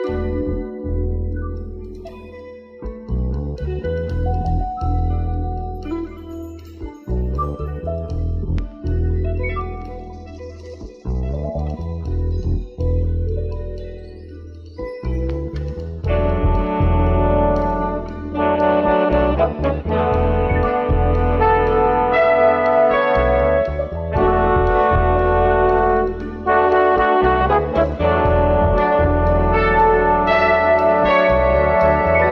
guitar keys and horns building steam, loops; Phonky

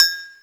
glass, Percussion; Misc Percussion

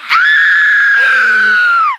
scream

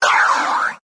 change le beat, zaps and beeps

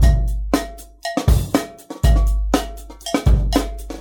cowbells, Drum loops Funky

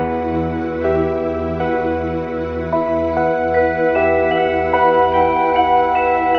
piano, Electronic Loops

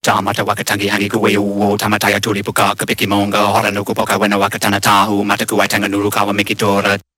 nonsense chanting, Voice gibbrish

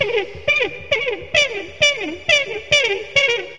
horns attacking notes, loops; Phonky

